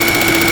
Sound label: sentry heavy spin loop, weapons; tf2classic